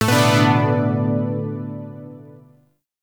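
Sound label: Shawzin Major Chord L; Zariman Shawzin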